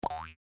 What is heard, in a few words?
Boing